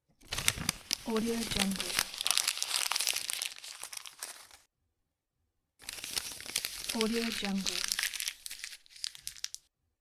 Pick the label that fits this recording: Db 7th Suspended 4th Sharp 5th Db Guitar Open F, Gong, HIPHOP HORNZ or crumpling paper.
crumpling paper